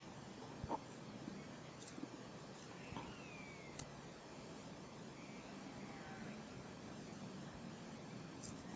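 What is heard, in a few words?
honey buzzard flight call; allen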